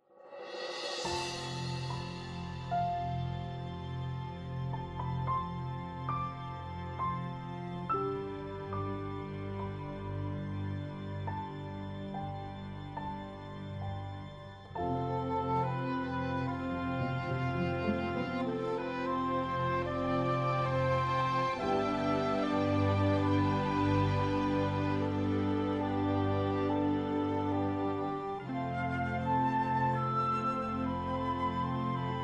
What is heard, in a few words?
Music